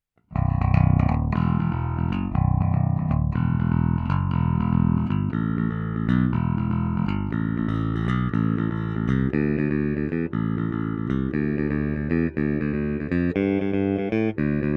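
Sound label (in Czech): S-S trsátko, nerezová ocel, Roundwoundy, Schecter SLS Elite-5 baskytara; D'Addario EPS170 ProSteel 45 - 130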